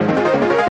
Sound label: double Up Flow Sound; jackpot 6000